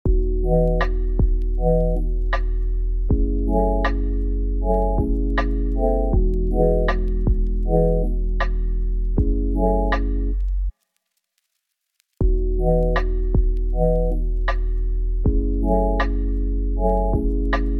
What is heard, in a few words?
Verse Full